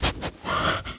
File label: small Win Anim; netent